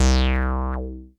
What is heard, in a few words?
BASS